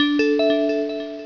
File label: Chime